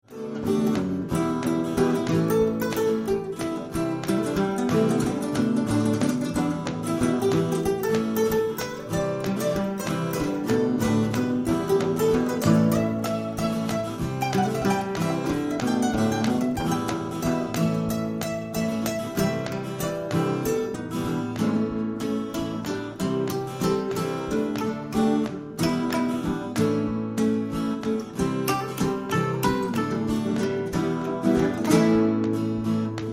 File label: Trio Impro